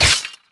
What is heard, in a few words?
beartrap activated